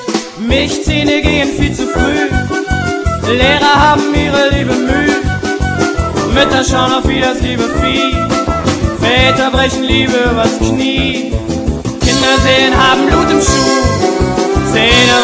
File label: polka